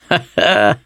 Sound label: laughter, mechanic, survivor